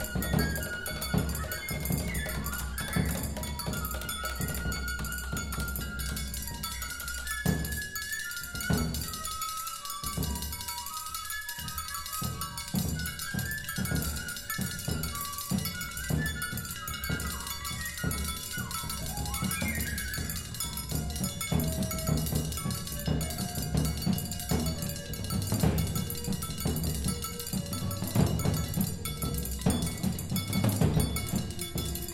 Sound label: Percussion Recital